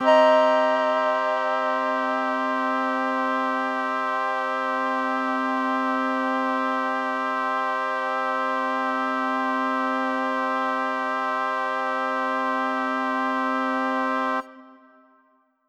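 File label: Mixed Winds C minor 7, synth orchestra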